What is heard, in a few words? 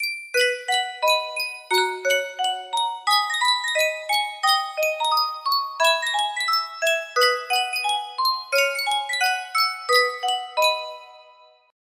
Sankyo music box, music box melody